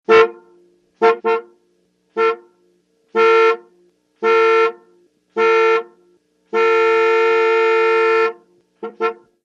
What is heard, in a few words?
60 Plym Int Car Horn